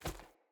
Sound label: Battle Dog Walk Veg, Footsteps